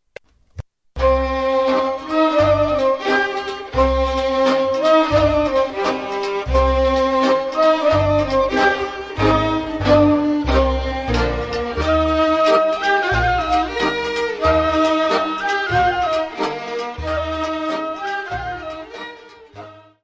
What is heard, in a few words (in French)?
Ciuleandra - Roumanie